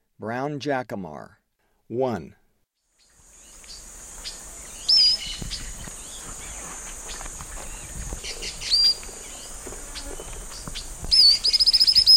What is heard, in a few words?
Brown Jacamar; S. America; Eastern Lowlands